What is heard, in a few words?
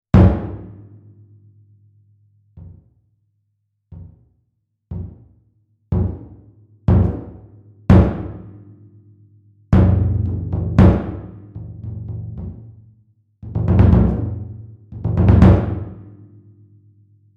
taiko Ohdaiko Hit and palm mute; fxpansion